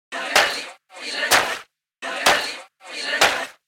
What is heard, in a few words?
toploop 126 pump; Wave Alchemy Loop Tools and Grooves